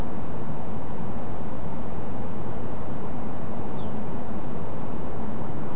Lapland Bunting, nocturnal flight call; Beijing

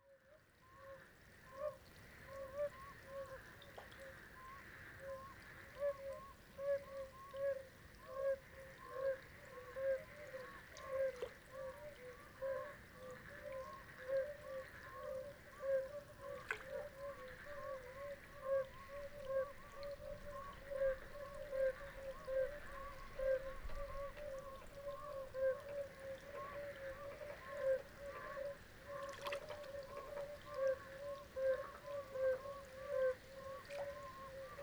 Oriental Fire bellied Toads singing; XiShan

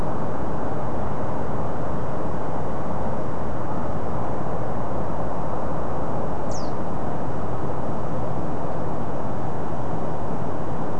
Pallass Bunting call, nocturnal flight call; Beijing; nocturnal flight calls (NFCs)

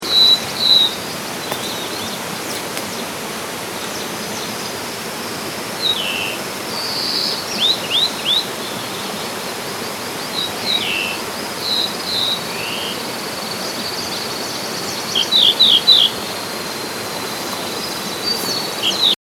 blackthroat song; paul holt

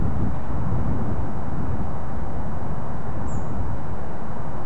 pipit sp, nocturnal flight call; nocturnal flight call project in beijing autumn 2021

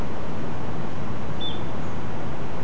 Little Ringed Plover

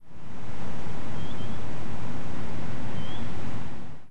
Grey tailed Tattler, nocturnal flight call; Beijing

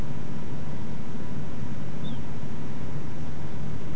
Little Ringed Plover call; nocturnal flight calls (NFCs)

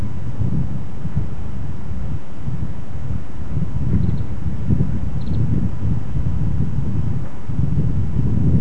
three note slow trill Lapland Bunting; nocturnal flight call; Beijing

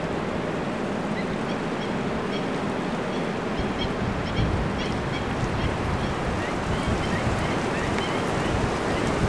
black winged stilt, nocturnal flight call; Lingshan; Beijing; nocturnal flight calls (NFCs)

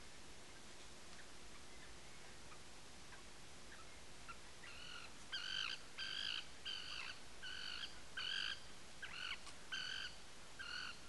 Common Tern series, nocturnal flight call; Lingshan; Beijing; nocturnal flight calls (NFCs)

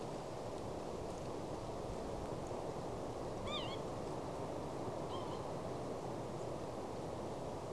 Northern Lapwing two calls; Lingshan; Beijing; nocturnal flight calls (NFCs)

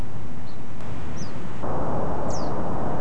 Pallass Reed Bunting calls

